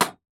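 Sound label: Metallic Strike Hit Impact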